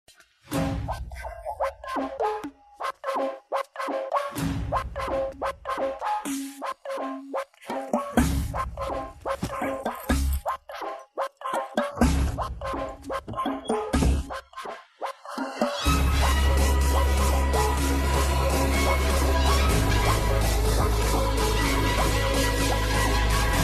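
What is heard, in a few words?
intro background music ringtone movie background music; raja raja chora; sree vishnu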